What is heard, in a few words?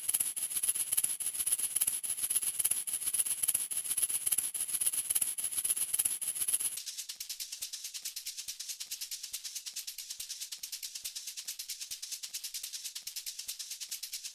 rattling energy